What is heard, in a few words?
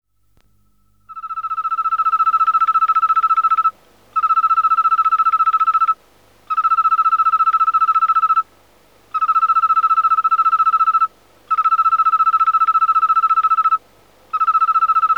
Oecanthus pelluscens (1:4 speed)